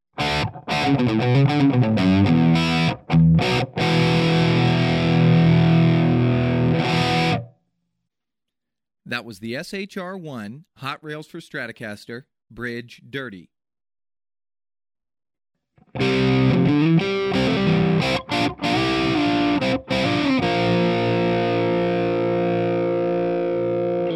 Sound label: bridge dirty, pickup per chitarra, hot rails; Seymour Duncan SHR-1B Hot Rails for Strat